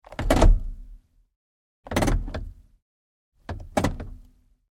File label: Truck GMC Savana Gearshifts; 2010 GMC Savana; Trucks; Vehicles